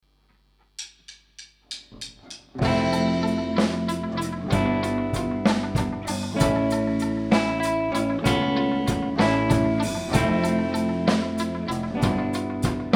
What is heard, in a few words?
Rain Live